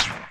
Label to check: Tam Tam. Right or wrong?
Wrong